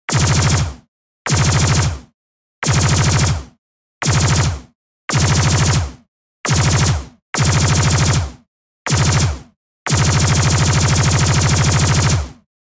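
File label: Robo Gun Sytrus Resample Multiprocessing Serial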